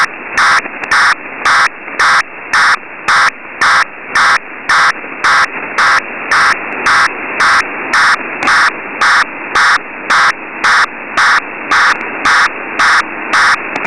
North Korean FSK1200 on 28149.2 kHz diplomatic traffic; DPRK